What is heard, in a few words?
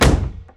staff Sound; BattleBuddies